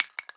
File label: percussion one shot; Pop; Drums; SD PASADENA Pop Sample Pack; Slate